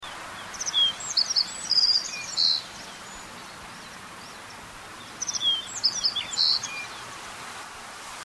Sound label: Black faced Bunting; Jiuzhagou